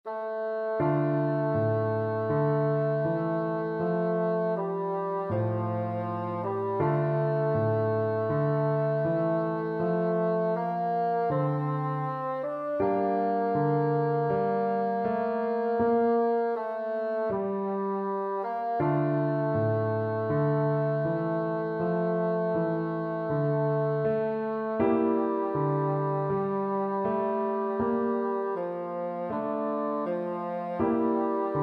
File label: bassoon